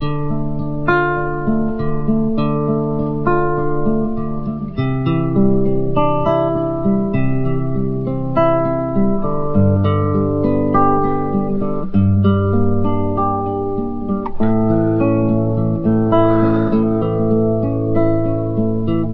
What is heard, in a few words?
guitar, Jazzmatazz Loops